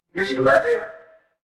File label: ui alert